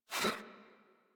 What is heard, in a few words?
Pet Alert Snort; Enemies; Warframe; Catbrow; Pet Ship